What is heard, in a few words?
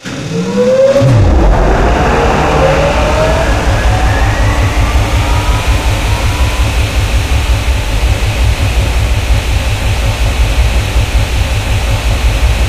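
gel pump start loop; Source Engine; Portal 2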